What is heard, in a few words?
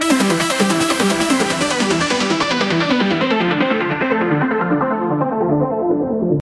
Synth, saw, fast speed, falling arp, medium reverb, falling high-cut, C major; Audialab EDM Elements